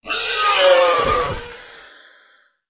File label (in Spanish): Lagarto death; Grounch